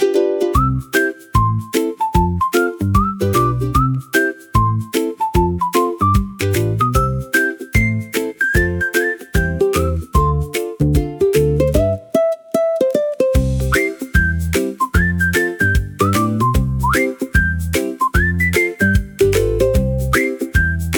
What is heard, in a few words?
ukulele tropical for bright and light